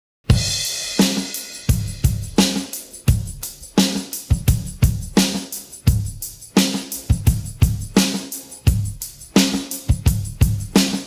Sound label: pattern, drums